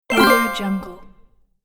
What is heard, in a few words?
online gambling bonus